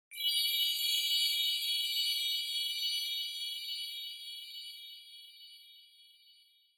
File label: Onoma Sparkle High Long; オノマトペ キラキラ; 高 長